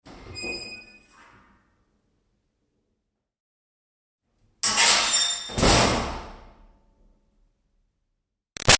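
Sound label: Door Metal Milking Vat Screech Open-Close; Hollow; Doors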